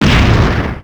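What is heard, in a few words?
Explosion Short Loud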